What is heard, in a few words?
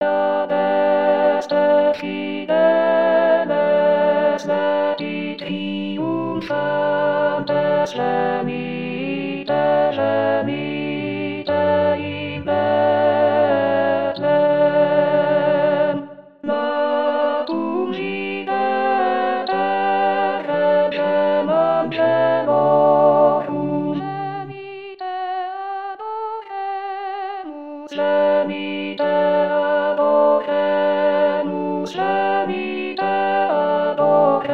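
alto; Adeste fideles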